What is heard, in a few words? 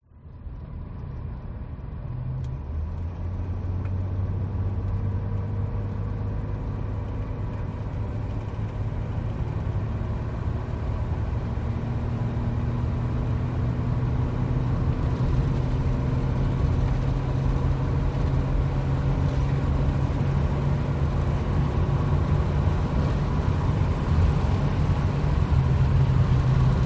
Auto American V6; audio hero